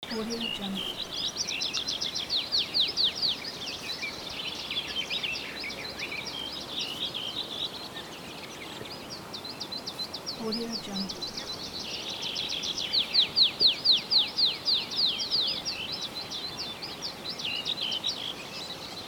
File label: forest